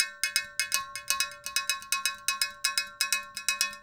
PERCUSSN ETHNO 125, Drum

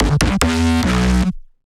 Bass

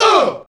UUH, VOICES

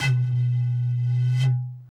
bottle, ethno, 125

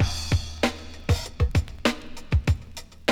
FUNKY 98, loop, BPM; 0- 99 BPM

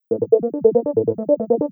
TECHNO SYNTH 140; Keys

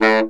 B SAX STC A1, Baritone Sax Stacc; Orchestral; VarazdinOrchestral

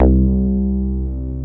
PENCIL BAS. C2, SYNTHBASS

